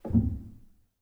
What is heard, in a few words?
rel, Grand Piano; bitKlavier Grand; Piano Bar